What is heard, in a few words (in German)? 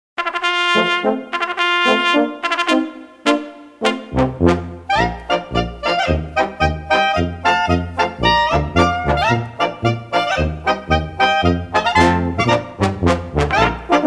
Kutscher Schottisch